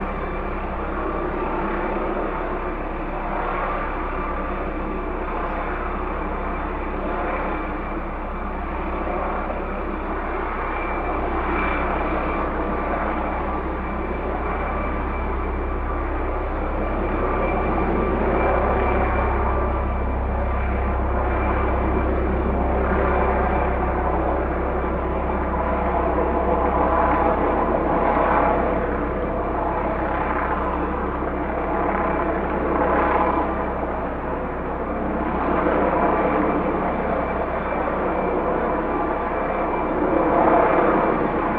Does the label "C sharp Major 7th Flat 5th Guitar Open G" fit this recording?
No